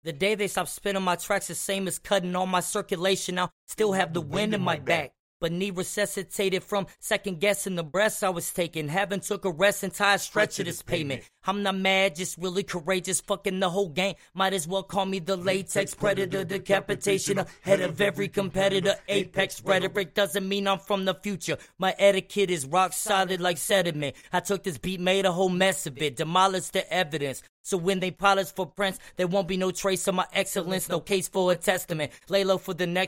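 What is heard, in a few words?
hip hop 153 DM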